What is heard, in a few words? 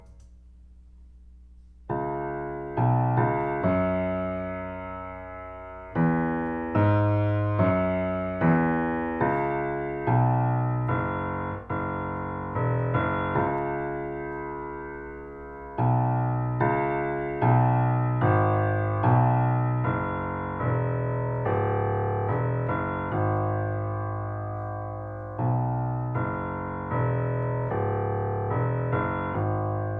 piano trio; Ravel; ear training level 3